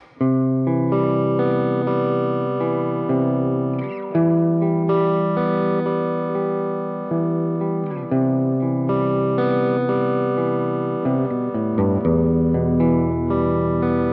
Deluxe1 SHOD, guitar, Deluxe1 – SHOD (Sweet Honey Overdrive); ReTube Custom Shop